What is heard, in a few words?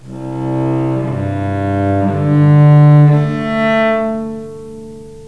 C G D A strings arco, cello